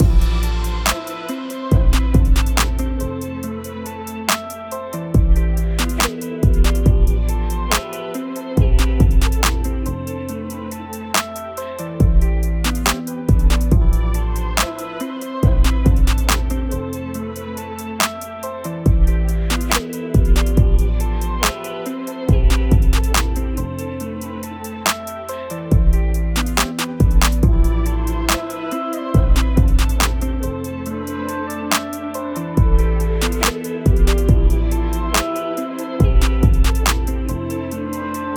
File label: A minor 140 bpm